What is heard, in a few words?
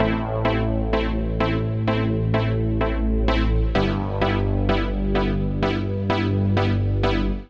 Poly Pad (Am) 128bpm; Music Loops; Poly Magnet Pad; Ableton; Factory Packs; Singularities